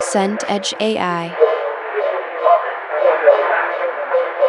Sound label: crowd indoor chatter